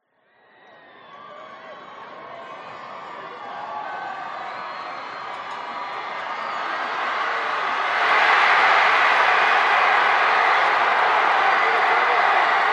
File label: american football touch down crowd cheer scream long